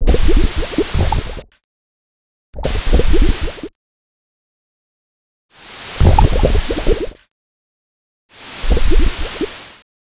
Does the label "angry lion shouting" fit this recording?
No